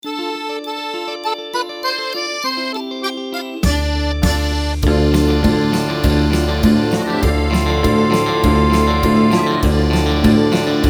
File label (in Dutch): met zang